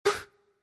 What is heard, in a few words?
Pain hit girl